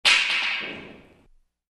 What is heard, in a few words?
Mop falls, stick; wood